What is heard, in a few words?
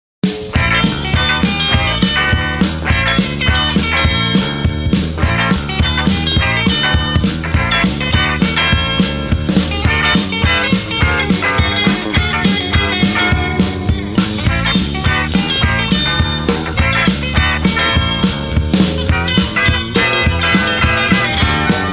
Blues